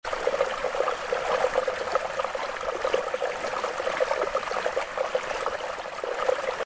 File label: gentle water flowing and splashing